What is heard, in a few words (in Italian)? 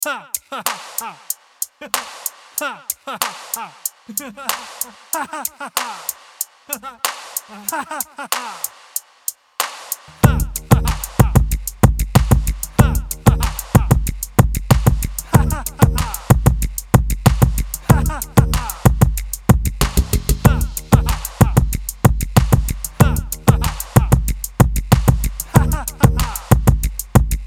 solo beat; Terapia della risata